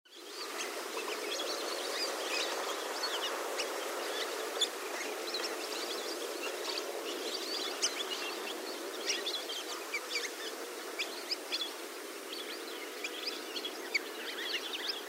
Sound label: Debarawewa Tissa, nature sounds; Animals heard in the soundscapes